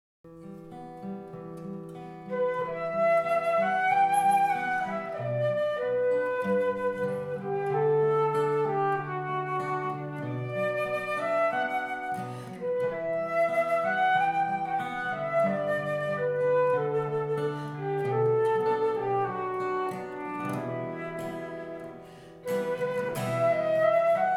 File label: flute guitar; llanthony valley; sosco; Cesura